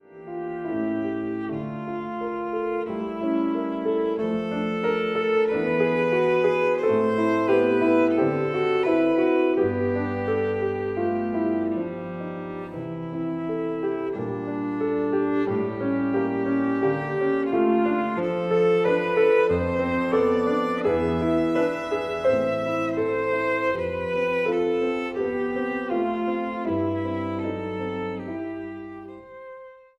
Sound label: VIOLIN